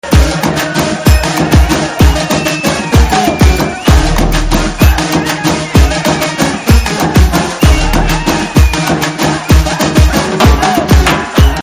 Drum Beats

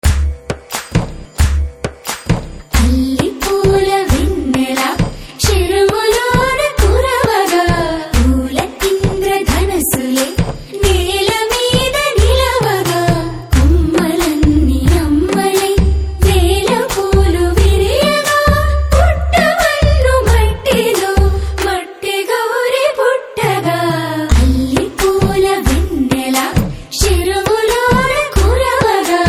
Ringtone

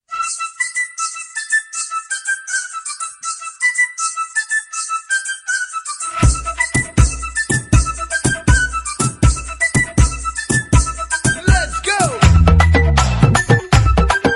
Flute Musiq

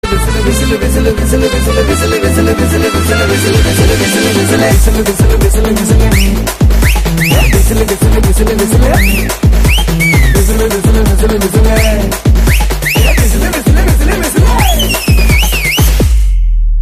Whistle Bgm; The Warriorr

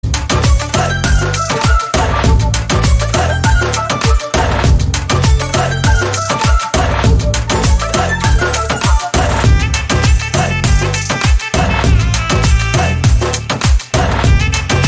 BGM Love Ringtone; Maanaadu

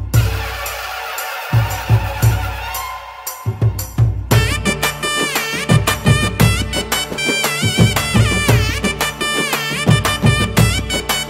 Kongunattu Drum Beats

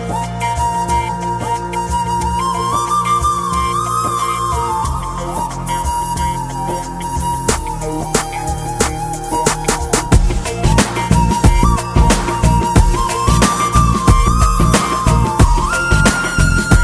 BG Flute Tone

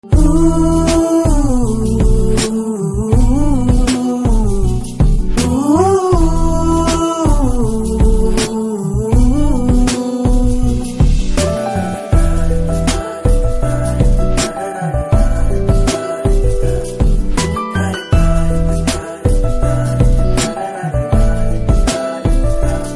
BG Humming

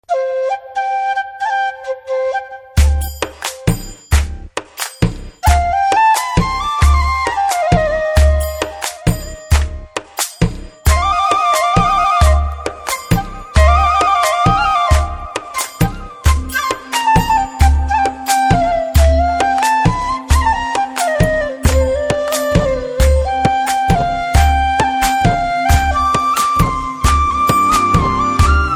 BGM Flute

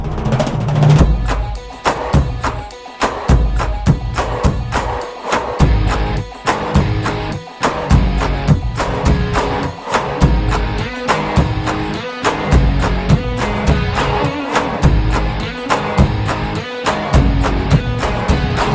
Drum Beats

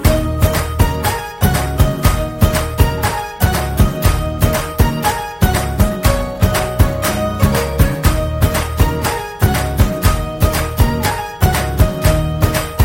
Instrumental Tone

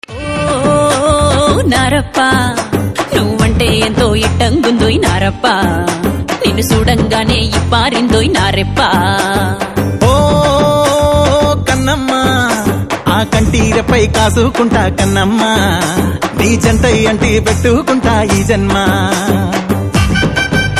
Ringtone

